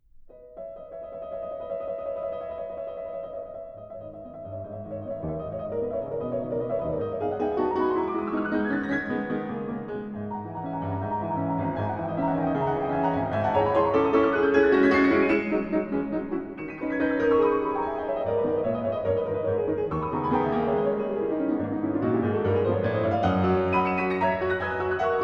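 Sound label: Op 25, No 6 - G# minor - 'Thirds', Etudes, Piano; Chopin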